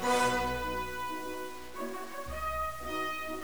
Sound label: trumpet